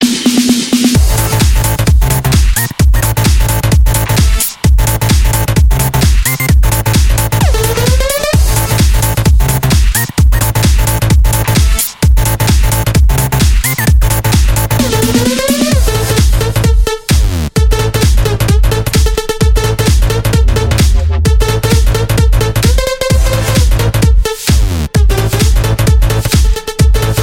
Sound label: Ring Elektronica